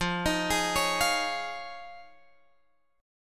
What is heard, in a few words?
F Minor 7th Sharp 5th, Guitar, Drop D